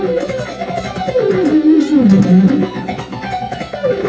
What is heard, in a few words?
guitar